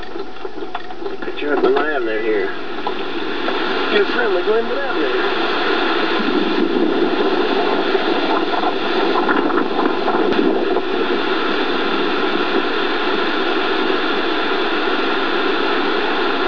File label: roaring hurricane, you can hear me say before I am drowned out by the wind; 1996; Glenwood; I stepped out of the car to give the camera a clearer view of the roaring hurricane